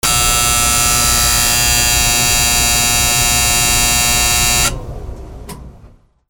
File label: HOUSE